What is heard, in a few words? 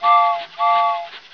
Train Whistle; TinyTown